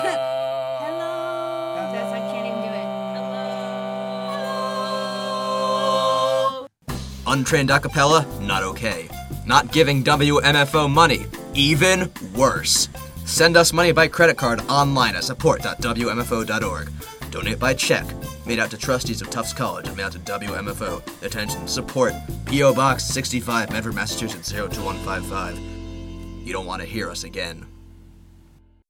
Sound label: PSA Donations Acapella